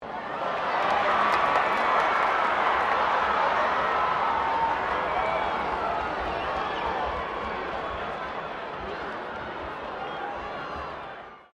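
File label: crowd 15K Rugby World Cup cheer low, Rugby world cup game; Crowd Sound Effects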